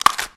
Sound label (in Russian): korotkij bistrij zvuk zvuk priganiya po staroj krovati